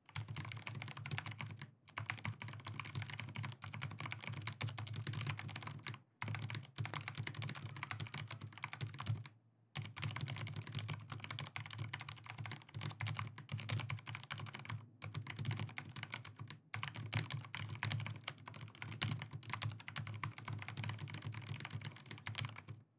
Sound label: typing